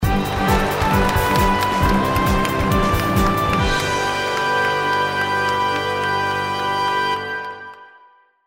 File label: End fanfare; Suburban Super Sports The Gumball Games